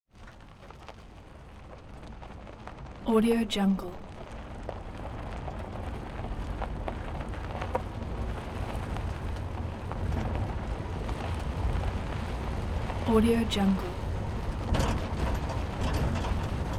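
1969 ford f150 pickup truck exterior driving on dirt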